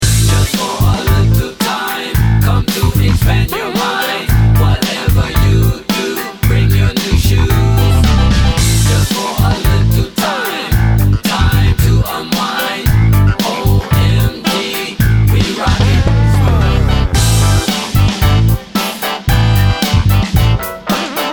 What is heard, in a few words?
Funk